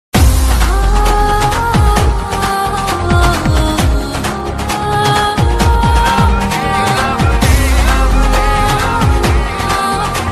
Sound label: ringtone instrumental; rab ne bana di jodi; rock